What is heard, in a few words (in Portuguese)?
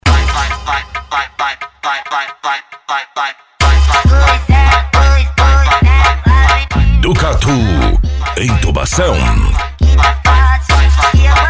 funk agressivo